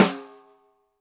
Snare, Roland TD-20

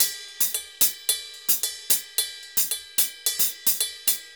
Ride Murga 110 bpm; Best Service; Festa Latina VOL-1

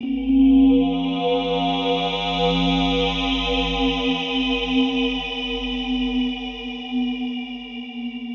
Warehouse; Zero-G - Future Wave

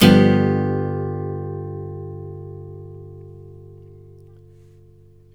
Blues Guitar, chords; ILIO; Fretworks

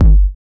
BD, Dance Mega Drums, BD HOU; Best Service

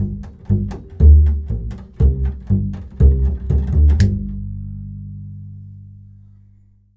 Bull Fiddle, Appalachian

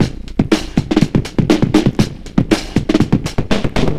flam trip, breakcore breaks

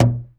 UGANDA DRM, UGANDAN; Spectrasonics; Heart of Africa 1

